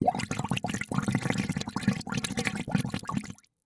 Liquid Water Bubbling Shallow In Metal Bowl Steady